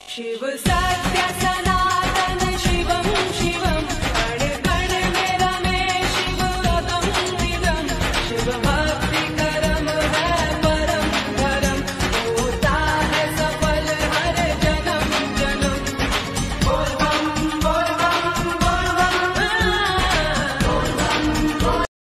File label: DEVOTIONAL RINGTONE